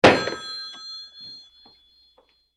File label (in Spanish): Efecto de sonido de colgar teléfono